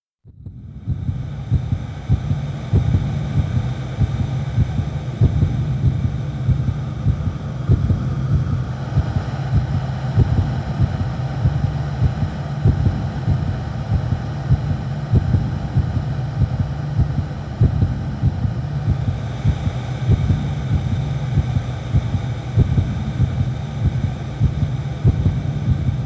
Vessel Room Stage (AMBIENT); King of Fighters XIII; PS3 version

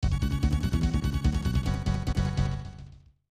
Hanger Out Jingle; Cyber Knight 2; snes

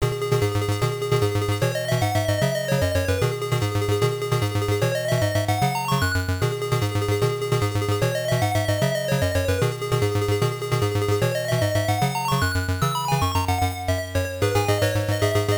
Battle; Heavy Metal System 2; arcade

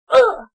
Dies in Lava; Tails

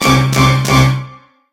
Alarm Egg Stolen; Angry Birds

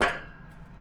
walk metal

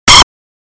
Reflected Magic Word (SFX)

